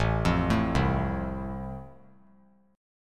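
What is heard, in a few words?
A Minor Sharp 5th, chord strum; Am#5 arpeggiated; JGuitar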